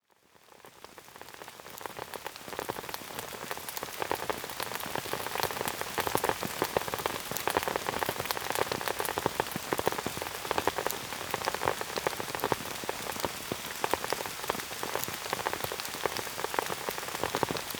Rain Sounds Nature Sounds Rain

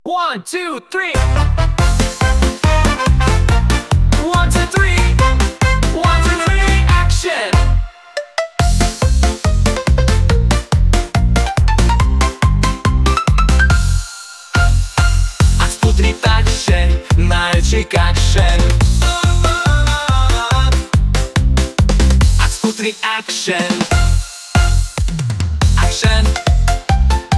Ocean Beats

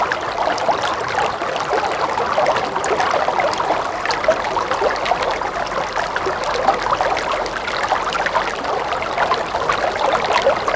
Relaxing Creek

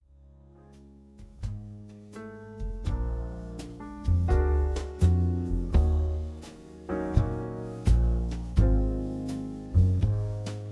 Jazz

